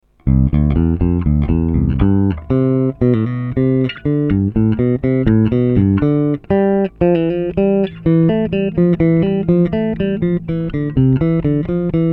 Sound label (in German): Lefay Herr Schwarz Sechssaiter; Bachschnipsel